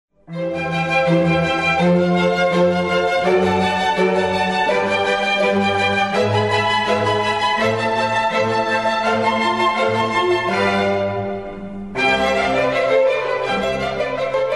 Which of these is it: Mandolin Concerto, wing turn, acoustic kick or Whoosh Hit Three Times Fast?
Mandolin Concerto